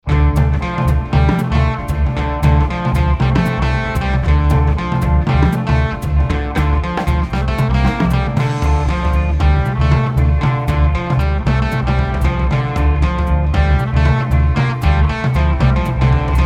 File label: Rockabilly